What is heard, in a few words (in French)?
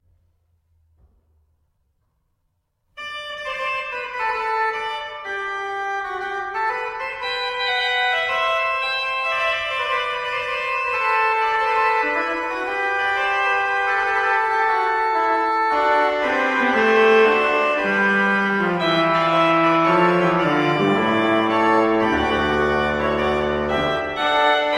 Gloria Trio les dessus sur la Tierce et la basse sur la Trompette; Francois Couperin; Messe a l'usage des Couvents